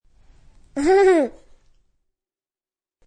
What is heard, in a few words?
Toddler Chuckle, Short toddler laugh; Talking; Voice